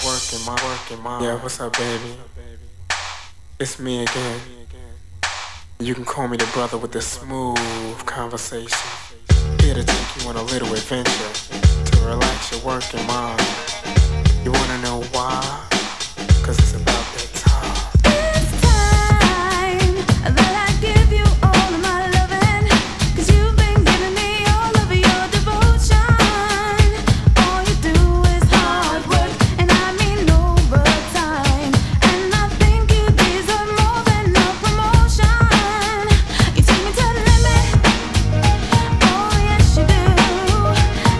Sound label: radio mix with rap